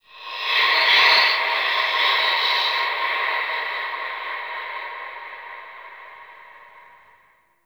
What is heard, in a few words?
Haunted Breath, Ambience; Warframe; Shadowgrapher